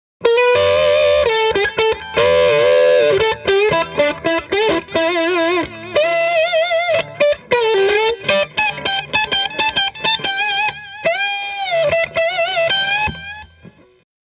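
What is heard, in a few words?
m75 lp mid pickup dist tone off deluxe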